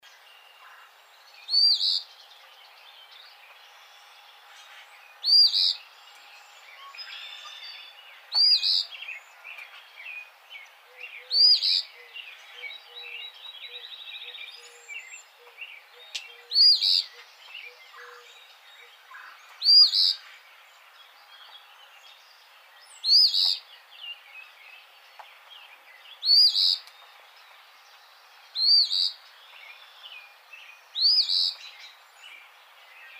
Eastern Phoebe

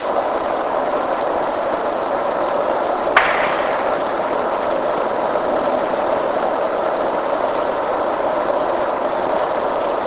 Wood knock; PA

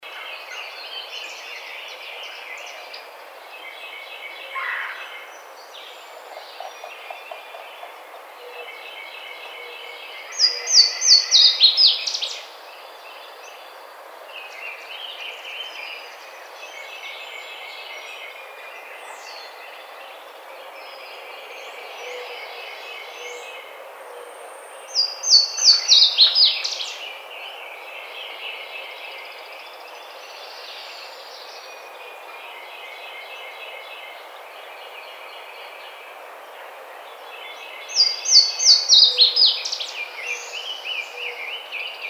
Yellow-rumped Warbler

